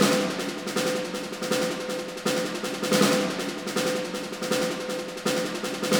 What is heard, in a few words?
Snare; музыка для фильмов